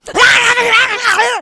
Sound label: rage, infected, female